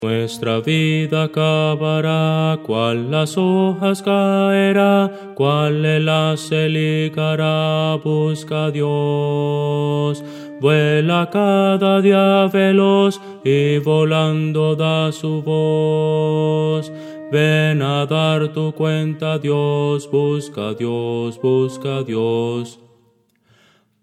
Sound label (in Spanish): contralto, himnos cristianos